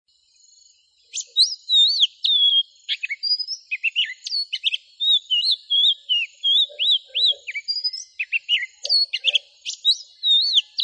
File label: oriental magpie robin